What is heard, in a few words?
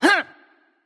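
grunt hit, female; smite; voicepack; hera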